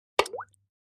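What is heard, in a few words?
Splash Small Plop Plastic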